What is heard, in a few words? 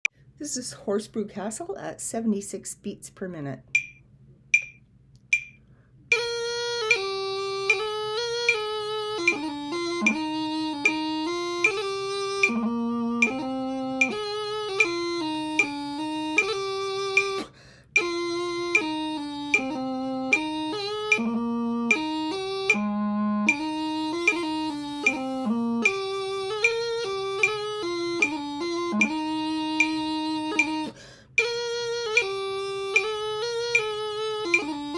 Horsbrugh Castle, pipers